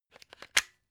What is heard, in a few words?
plug unplug clatter